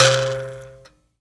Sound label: MBIRA C 2; BS MBIRA1; Heart of Africa